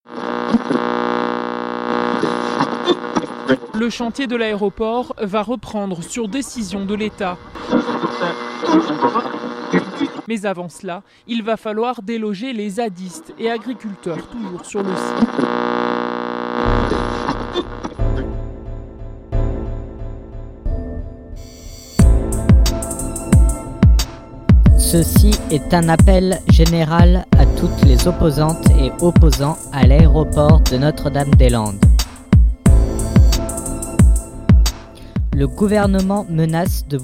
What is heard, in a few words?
jingle hip hop; zad